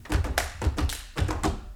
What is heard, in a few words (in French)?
musique de table